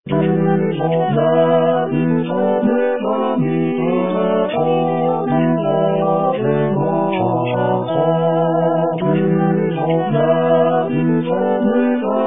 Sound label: Tourdion SATB; Pierre Attaignant